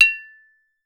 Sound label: ice bell, metal bells; Voice Crystal - Steve Reid Definitive Percussion